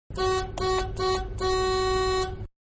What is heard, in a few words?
Windows Open Interior, Van-Horn-Windows Down-Interior; Cars (Sound Effects:Vehicles)